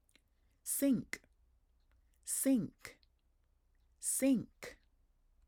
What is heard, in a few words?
sink